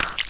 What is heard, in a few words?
flesh, debris; XDM